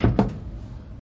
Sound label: Lever Rubber Fall, levers